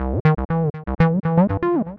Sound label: TB303 like pattern 120 bpm